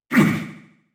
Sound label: whipper pain; Fight Knight